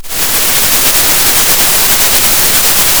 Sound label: Sound of a thunderstorm